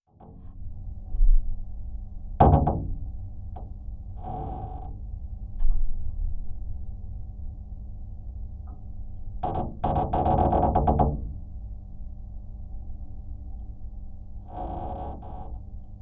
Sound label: Geofon, Harbour, Geofon, Ship; underground sounds